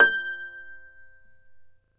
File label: Celtic Harp